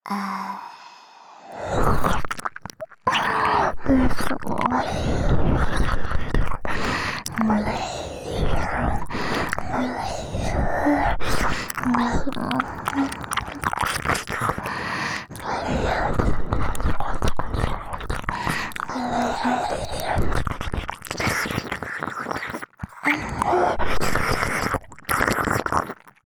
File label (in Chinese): 又舔又含的舔耳; 时雨姐姐也想要抱抱; NG音声